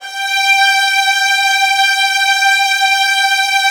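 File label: violin; Virtuoso Strings; G3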